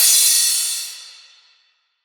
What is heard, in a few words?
Cymbal, PsyTrance